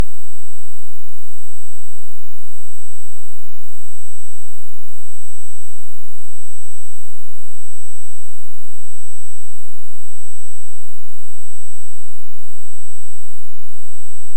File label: Hydrophone; OneOcean